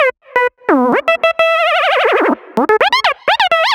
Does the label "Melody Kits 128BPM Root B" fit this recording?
Yes